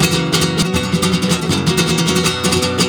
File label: GUIT GROOVE; Best Service; World Colours